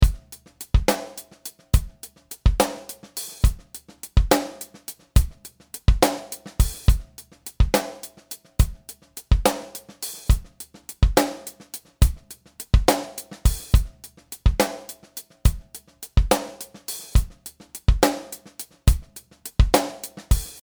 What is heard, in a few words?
Drum Dry